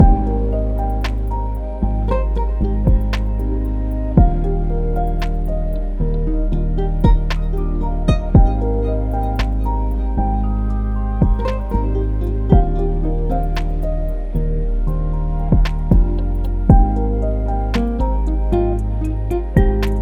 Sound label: Lofi Music Loop; Kawaii Love Lofi Music Pack 2